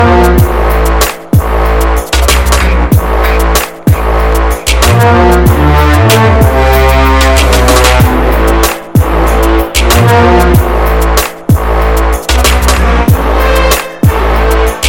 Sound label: taiko